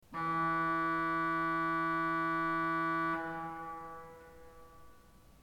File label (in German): ton, orgel